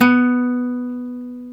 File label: GTR Nylon, Roland